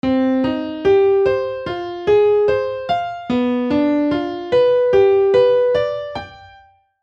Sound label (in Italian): Arpeggio base